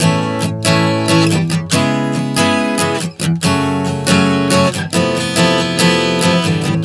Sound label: A-C#m-F#m-F 140 bpm, acoustic guitar chord progressions